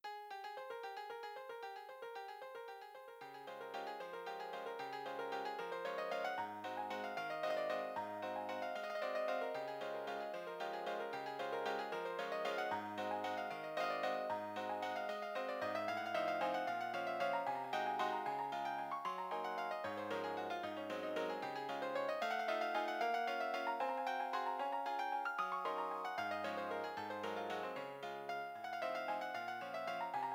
nokia 2600 chopin ringtone

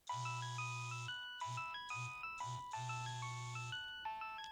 nokia 6100 ringtones

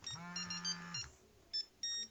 nokia 5510 ringtones

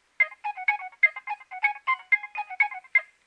nokia3100 ringtone

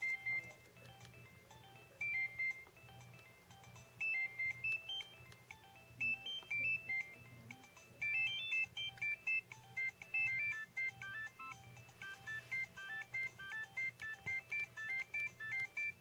nokia 2610 ringtone clock alert

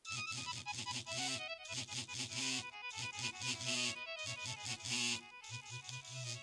nokia 6100 ringtones partita

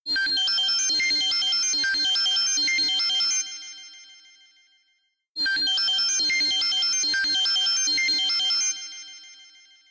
samsung galaxy s4 popcorn tone ringtone

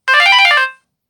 Chimes high, ringtones

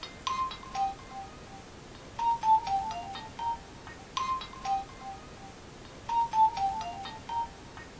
nokia 1680 ringtone; brook

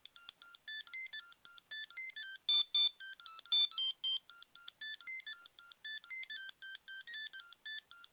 ericsson t39 ringtones

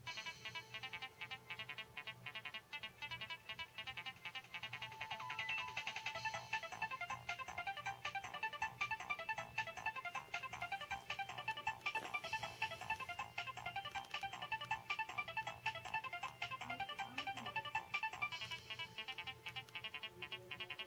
nokia 2610 ringtone discover

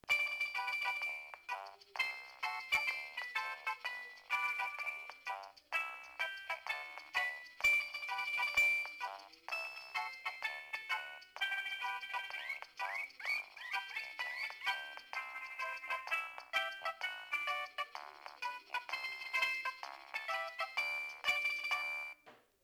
nokia 1661 ringtones; beach

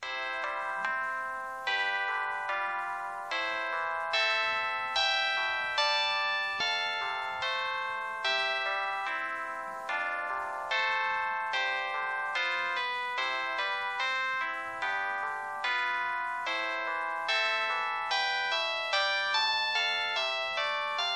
cannon ringtone, samsung sgh t100

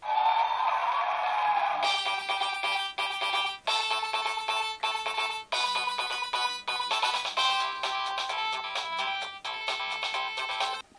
samsung sghe700 ringtone

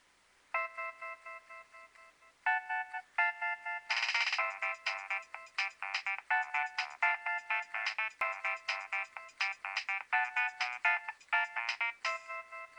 nokia3100 ringtone

